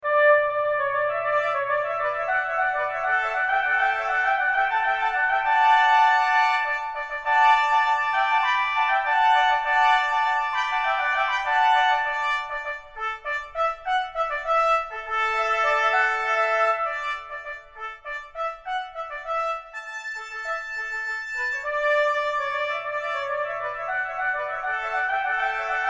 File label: Wedding Fanfare